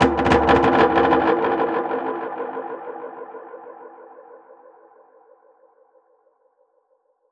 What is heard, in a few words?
Perc Hit, dub percussion